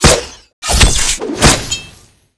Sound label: Claw